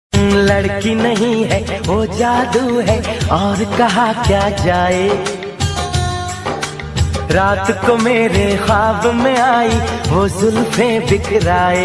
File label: Old Ringtone